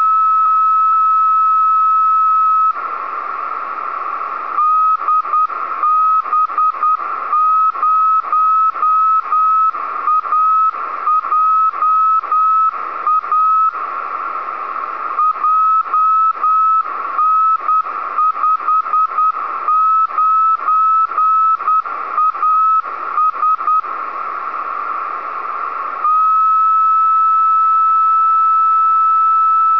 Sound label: beacon 24GHz